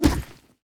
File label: order move